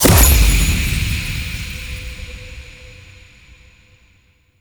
Struggle Prompt Success; Warframe; The New War

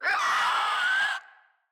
Infested Crawler Attack Short, enemies; Crawlers

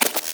Screen Glitch; Warframe; Caliber Chicks Minigame

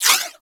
Laser Whizzby; Warframe; Bullet Impacts; Whizzbys

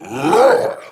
Punch, Barks; Kuva Lich; Persona A; Ability

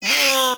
Feral Vocal Pain J; Warframe; Catbrow

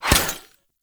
Glass Shatter Damage Hit Effect; Warframe; Warframes

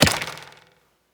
Hunter Bow Arrow Impact Rock; Weapon Impacts; Player; Bows; Environment; Envoy Hunter Bow

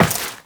Step Metal, Footsteps Walk; Warframe; Characters; Tenno

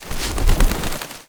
Bird Three Wing Flap; Warframe; Entrati

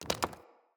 Mockery Foley Movement; Enemy; Mockeries; Characters

